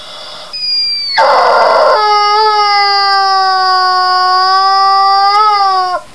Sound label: chick attack strong, chicken